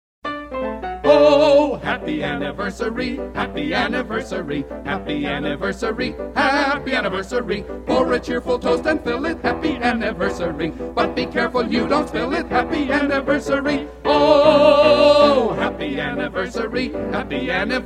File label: quartet; Rossini